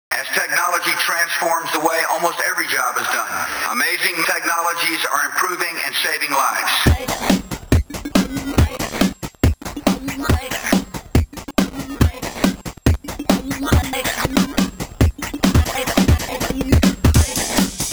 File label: Techno